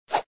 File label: whoosh; Gun Mayhem 2